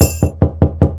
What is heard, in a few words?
soft hitwhistle; osu!